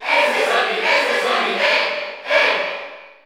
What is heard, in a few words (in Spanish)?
Cheer Spanish; Sonic; SSBU